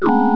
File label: bend down; Goldwave